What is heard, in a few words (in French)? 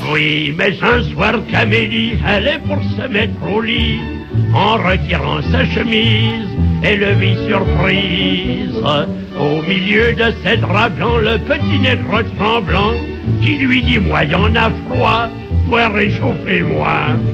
Chanson